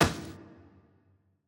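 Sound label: ambience room polygon punchbag training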